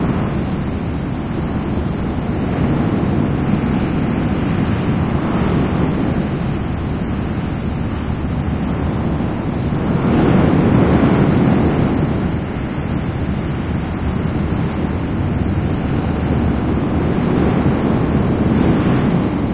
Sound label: wind light loop, ambience; source engine; ep2